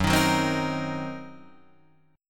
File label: Fsharp 6th Flat 5th down Guitar Drop D